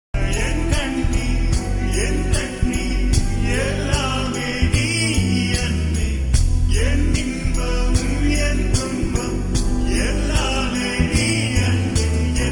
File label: Ringtone Slowed Reverb; tamil; Madraskaaran